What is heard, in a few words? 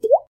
waterdrop